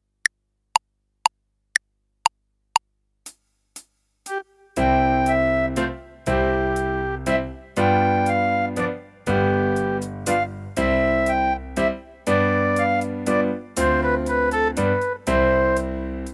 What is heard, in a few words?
Minuet, fife